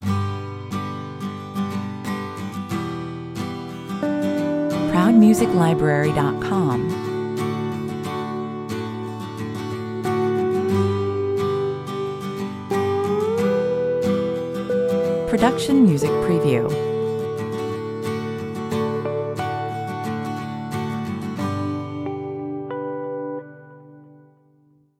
acoustic guitars; Elegant pop rock with pleasant guitars and peaceful piano. Expressive and profound verse, powerful and emphatic refrain

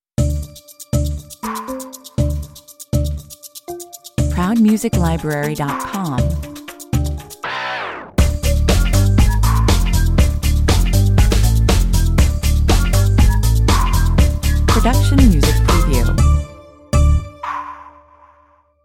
get up stinger 94 bpm

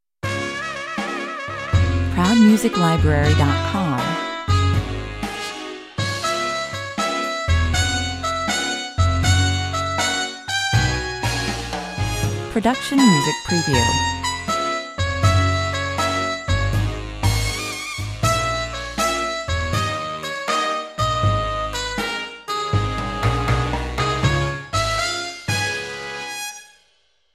trumpet mute blues

